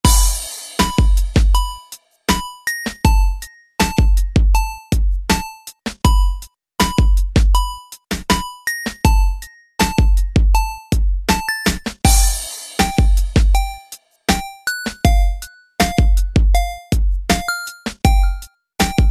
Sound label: Beat